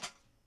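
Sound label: snare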